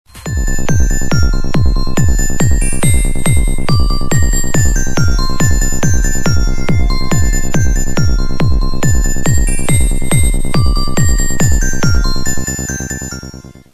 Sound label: Trance; dedmoroz